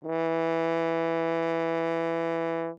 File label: Horn ff E3, Brass; MIS Pitches - 2014